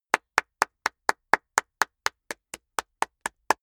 clapping fast

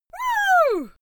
female cheer woo; schreie; menschen

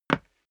step boots wood parquet slide short

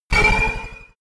sci-fi laser shot hard; krieg-waffen; soundpool

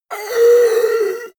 monster inhale; kreaturen

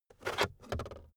car backseat table pull out cupholder

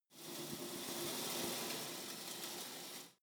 pullup machine cable release soft; sport freizeit; fitnessstudio

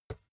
basketball catch finger tips soft

